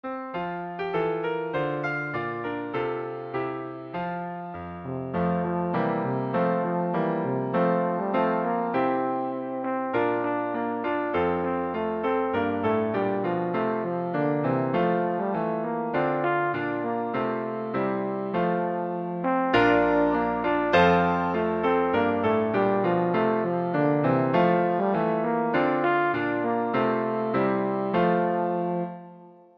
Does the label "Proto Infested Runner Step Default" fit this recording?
No